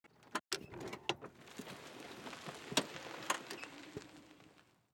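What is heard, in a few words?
golf cart changing gear and steering; stereo; soundscrate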